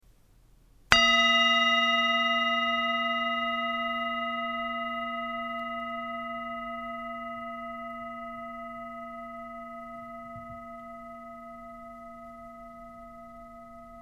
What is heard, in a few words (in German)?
Klangschale